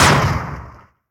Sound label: shoot; HeavenStudio; shootEmUp